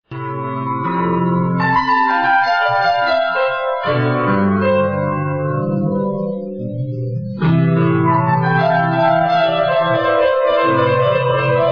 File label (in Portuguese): piano belissimo